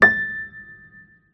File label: piano a6